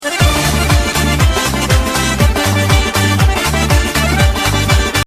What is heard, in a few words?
LEZGINKA